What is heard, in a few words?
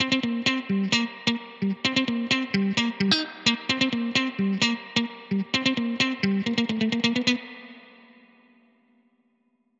Reverbsystem 9 Mono Clean Guitar